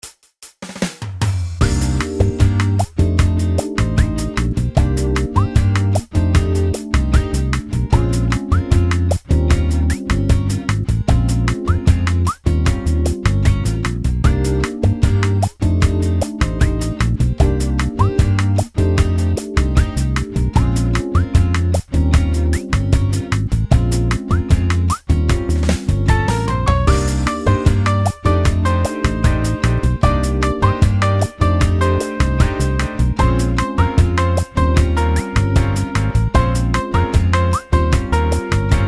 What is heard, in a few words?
music menu the lounge; Teeworlds